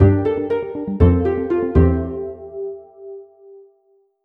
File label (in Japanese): ジングル; touhou